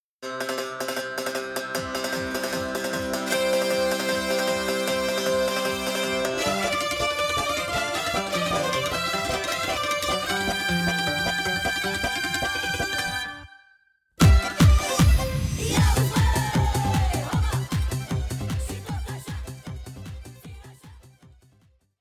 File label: folk music